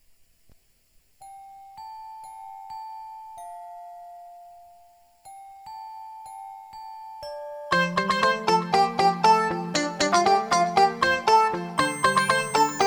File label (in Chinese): 女 混响 唱歌